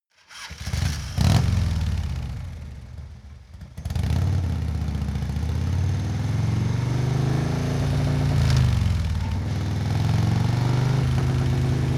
motorcycle harley ignition start engine accelerate